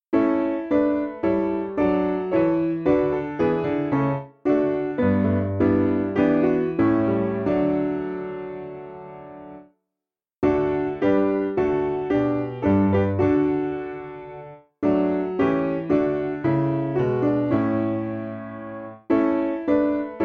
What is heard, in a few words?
Piano